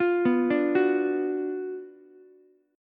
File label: F sharp Major 7th Suspended 4th Sharp 5th, F sharp, down, Ukulele; F#M7sus4#5 arpeggiated